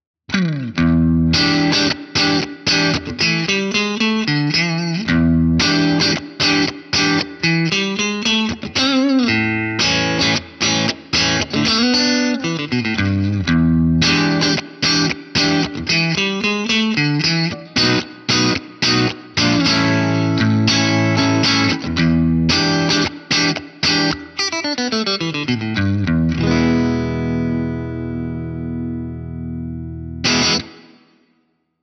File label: breakup; jensentone